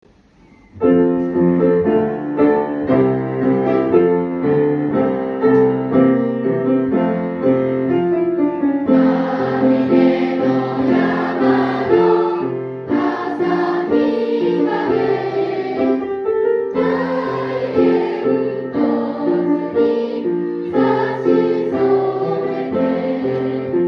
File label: school song; nakamachi